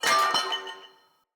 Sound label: Corrupted Battle Dog Bell Swing Short; Foley